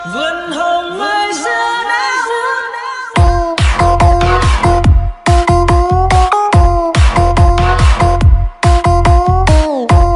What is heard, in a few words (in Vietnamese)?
Nhac Chuong Remix